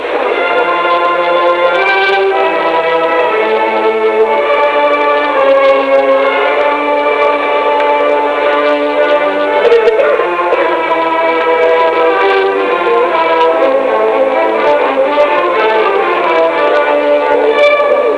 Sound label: orch victory